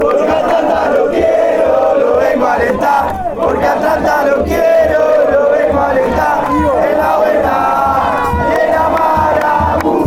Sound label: atlanta chant